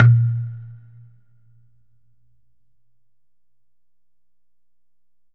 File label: COCONUT WET